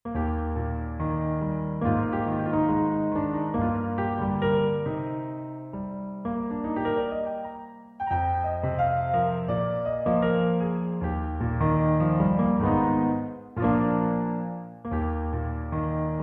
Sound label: nameless piano